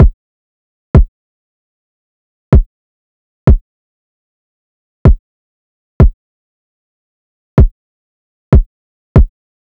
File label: Drum Loop, 95 BPM Kick, West Coast; Loop Stems; Cymatics; GHOST (Beta Pack); Alameda